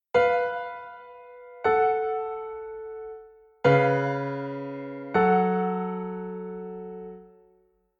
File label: si mi sharp la fa sharp